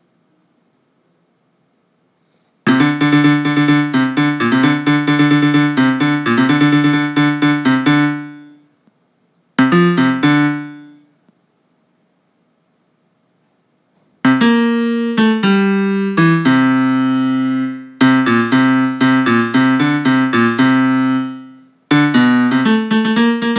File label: melody